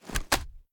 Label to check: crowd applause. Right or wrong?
Wrong